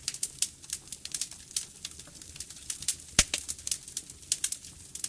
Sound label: comfy fire